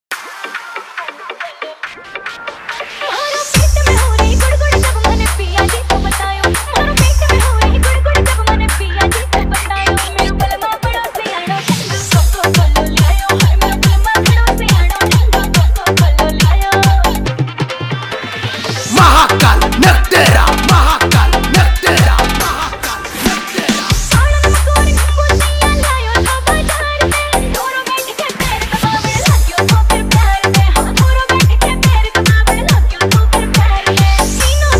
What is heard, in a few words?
HARYANVI SONG FULL HARD DJ REMIX; GMS